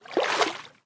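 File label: quiet splash of waves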